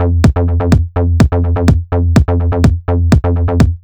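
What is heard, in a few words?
125BPM Kick Bass, techno; techno drum fx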